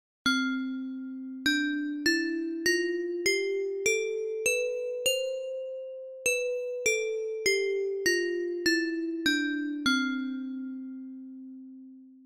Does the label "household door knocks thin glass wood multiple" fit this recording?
No